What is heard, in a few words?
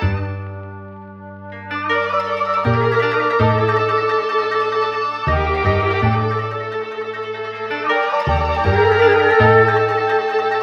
guitar